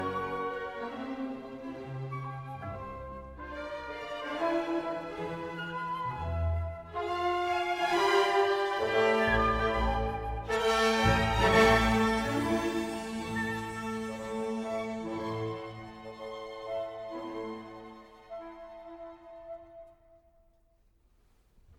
4th Movement Development More Bassoon Interjections; Bruckner 9th; Rattle